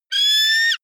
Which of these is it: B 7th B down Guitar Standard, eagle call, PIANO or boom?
eagle call